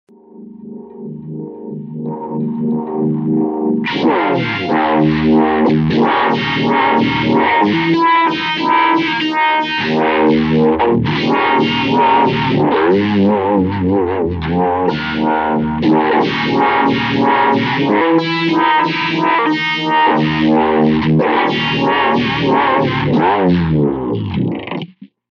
uni vibe distorted

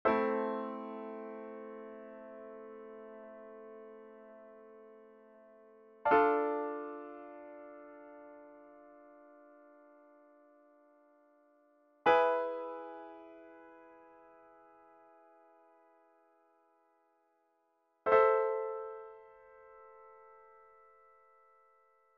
Minor Chord Progressions 145 E MAJOR

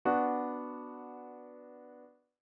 Minor Chord Progressions Example 5 B MINOR

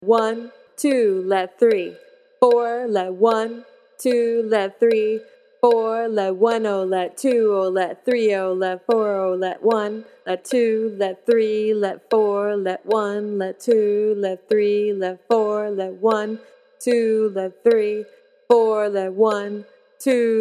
Swing

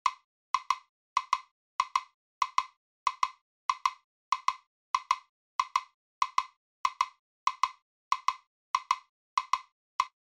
Samba Rhythm

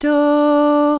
easy melody tonic

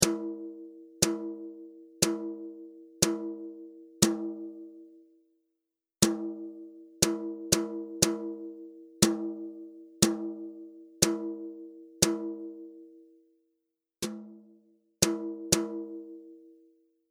RHYTHM

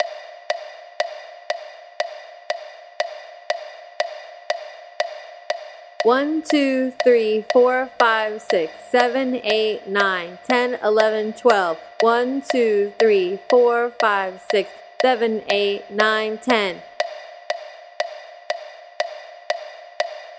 SLOW; 12/8 Example 1 Slow

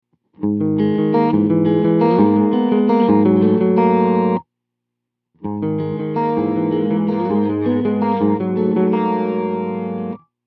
chorus

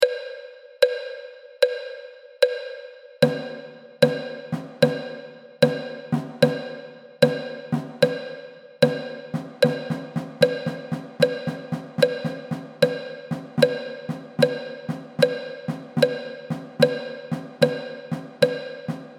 Swing

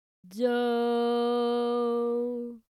sung tonic

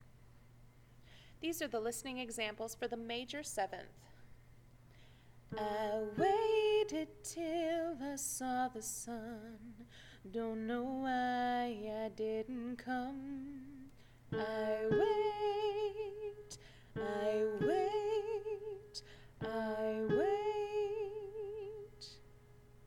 major 7th; Reference songs: Major 7th

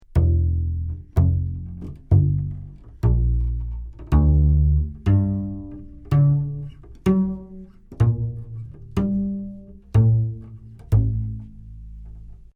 Upright Bass Pizz Phrase

